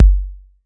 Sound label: BD 808; Wizoo - Platinum 24 Electronic; CHR BD 808